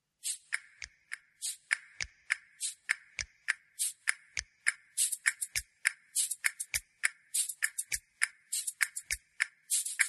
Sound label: Happy children's song